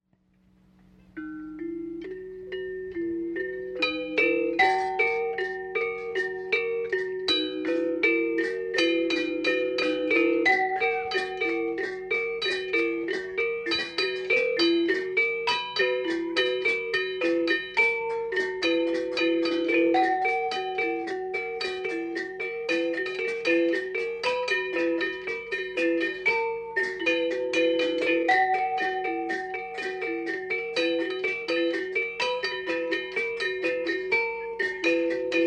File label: Asak selunding Rejang Semengan 120cents